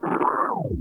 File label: record scratch